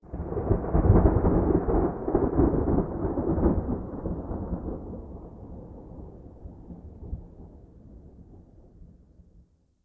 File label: Night Rolling Thunder; Ambience